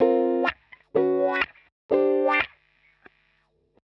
125 wah 3 chords G Ab A, wahwah; PowerFX - Nu Jazz House

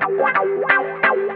GUITAR

